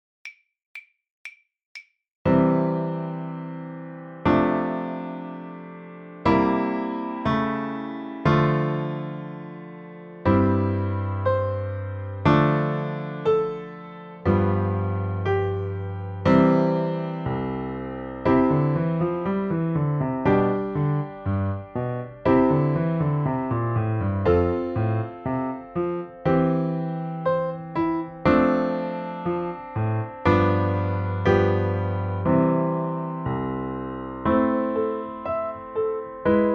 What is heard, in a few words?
C Major Scale; The Violin Fun Book (Level 2)